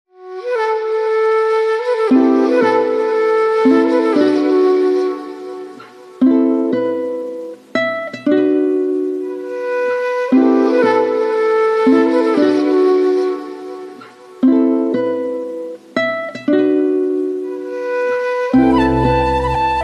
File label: Flutes; Drumify; Flutes Pack Vol.2; Multi Kit